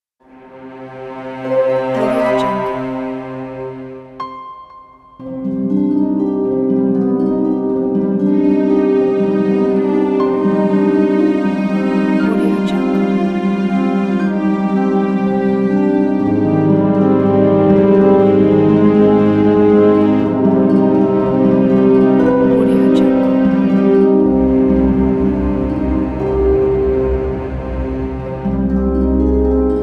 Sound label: dramatic